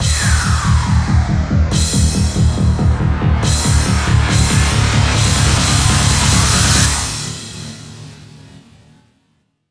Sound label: 140BPM 4Bars, HandsUp & Trance Fills; Vengeance Samples